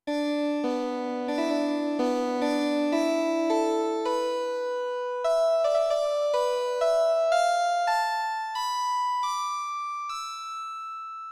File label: Tamboura